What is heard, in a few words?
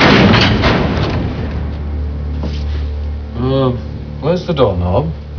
door knob; Spike; slamcrash; "Uhhh..Where's the doorknob?"; BTVS